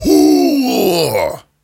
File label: Grunts and Chants, Viking Vocal